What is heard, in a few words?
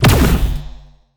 Laser Shoot; gamerip; MiSide; anime